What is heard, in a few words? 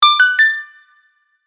audio test